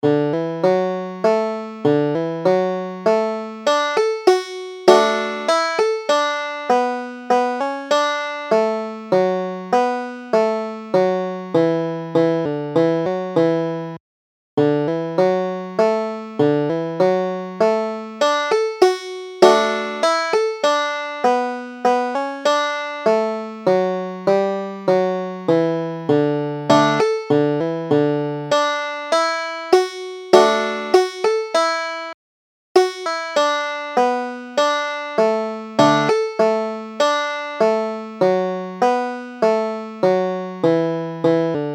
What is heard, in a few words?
banjo